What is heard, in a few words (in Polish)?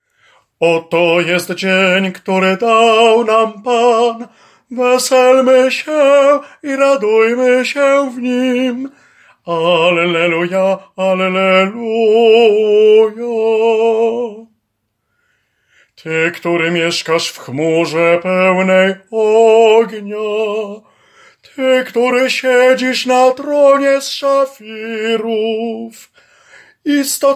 SOPRAN